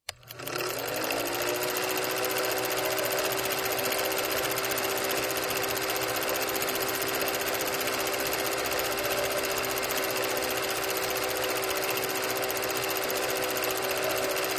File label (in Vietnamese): Tieng may chieu phim cu